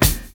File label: FUNK; Univers Sons - Kitch 70s